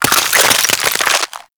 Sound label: Ice Freeze Hit Effect